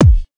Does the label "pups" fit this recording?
No